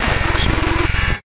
Teleport Glitch; MiSide

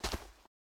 Step Ground; Game rip; MiSide

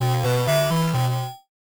Win; MiSide

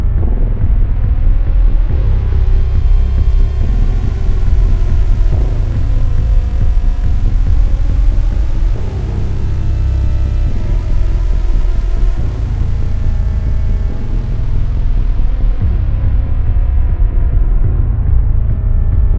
Ambient Arena; Game rip; MiSide

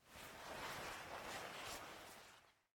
Move Chair After Screamer; Game rip; MiSide

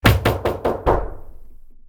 Knock; MiSide

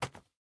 Food Drop; MiSide

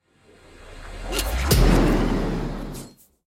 Hand Pre Shot; Game rip; MiSide

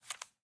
Change Card; MiSide

